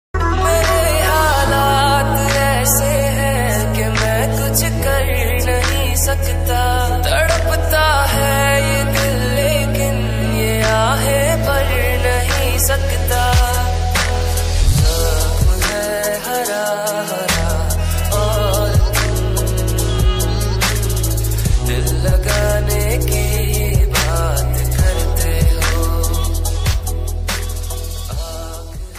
Hindi Sad Song Ringtone

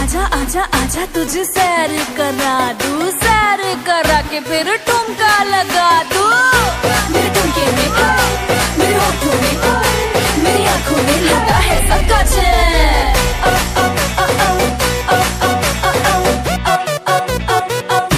Indian Song Ringtone

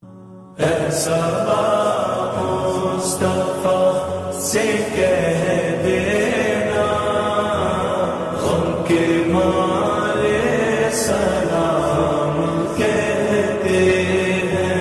Naat Ringtone

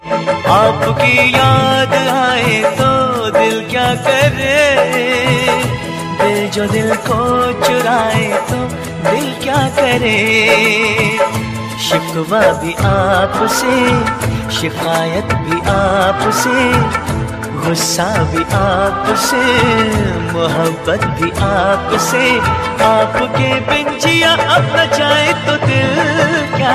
Trending Old Ringtone

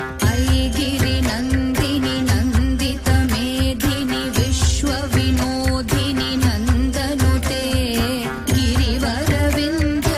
Aigiri Nandini Bhakti Ringtone

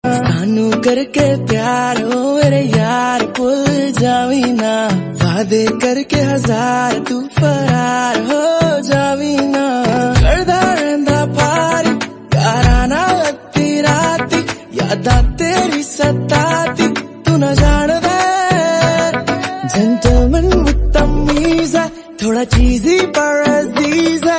Punjabi Song Ringtone

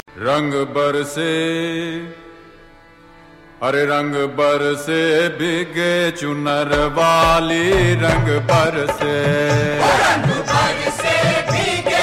Holi Special Ringtone

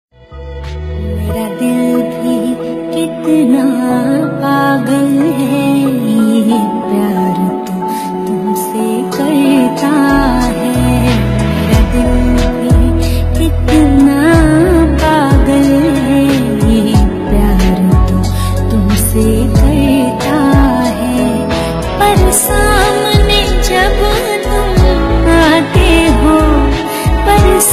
Old Hindi Song Ringtone Female Version

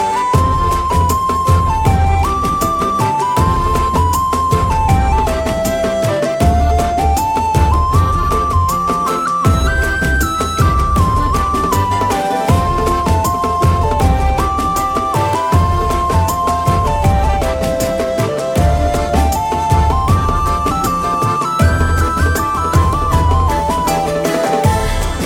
Flute Ringtone for Mobile

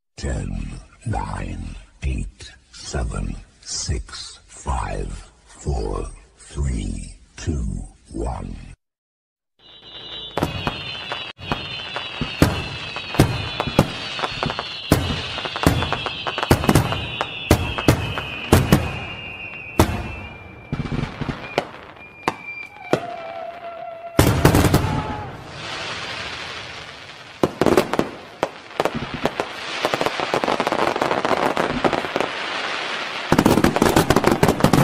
Happy New Year Celebration Ringtone

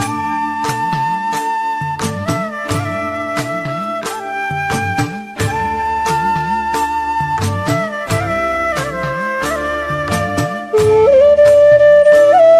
Harivarasanam Flute Ringtone; ringtones

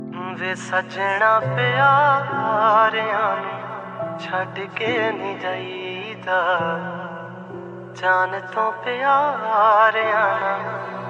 New Punjabi Sad Ringtone

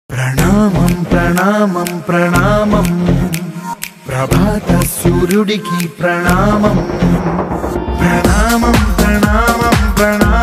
Movie Song Ringtone; Janatha Garage

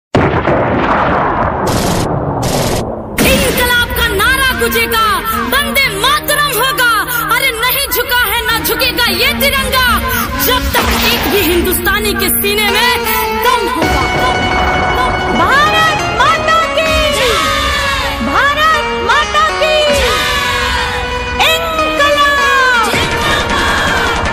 Desh Bhakti Ringtone

